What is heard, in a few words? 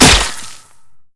basic attacks; Zyra